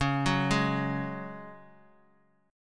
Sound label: C sharp Suspended 4th C sharp down, chord; arpeggiated; JGuitar